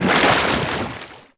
Oars Water; Civ4 warlords

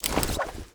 mg equip

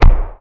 combat atmosphere; World of Tanks

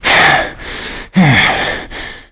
player climb breaths; Call of Duty Modern Warfare

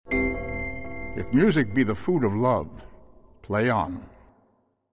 Tech Music; Civ4 beyond sword

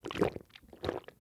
drink; Rust

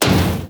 damage hits explosions

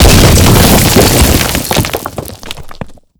collapsing burst; gulag; Call of Duty Modern Warfare

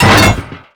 hydrant body impact; Explosions

